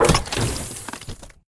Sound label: Shield Destroy; Game rip; MiSide